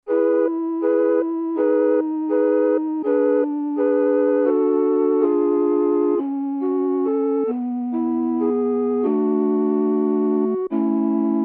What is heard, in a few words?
Flute Gone By